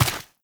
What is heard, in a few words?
step, footsteps, player, mud